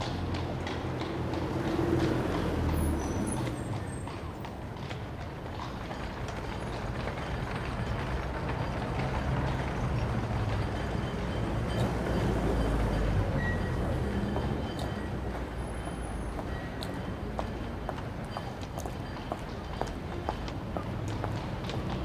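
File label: London apartment exterior evening; the 39 steps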